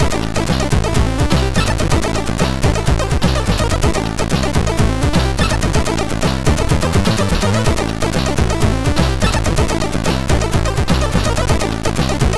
Fade In Intro, MOS6581R2

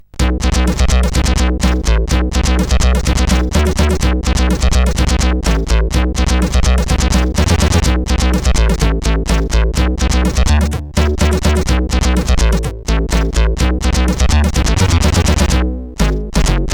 Funky Bass, CSG8580R5

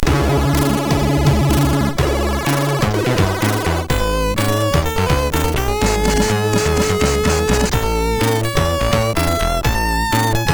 Hawkeye loader, MOS6581R4

